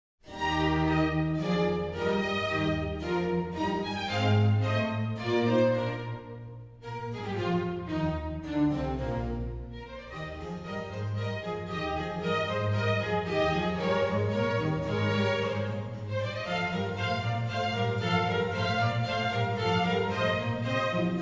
Concerto in E Flat Major for Horn and String Orchestra - Allegro; Baroque.horn